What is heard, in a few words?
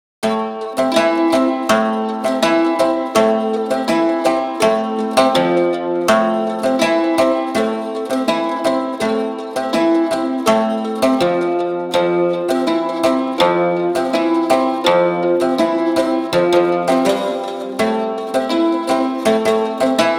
Acoustic